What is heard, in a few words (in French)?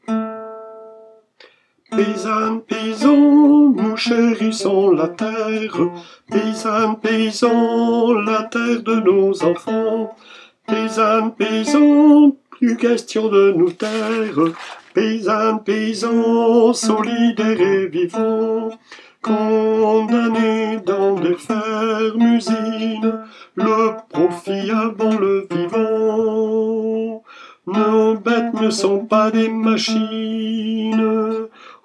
paysans basse; repertoire chorale; Paysannes paysans